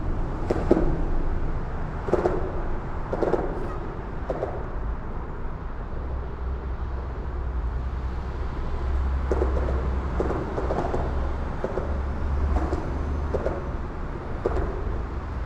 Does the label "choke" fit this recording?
No